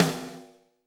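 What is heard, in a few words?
snare drum, Drums; Bob Clearmountain Drums I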